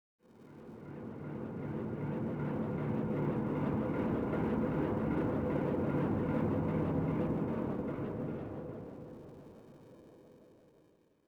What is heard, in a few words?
old fan; ambience; Soldier Of Fortune II Double Helix; XBOX; kamchatka